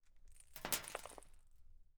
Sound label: Sand Pebbles; TOOLKIT vol.2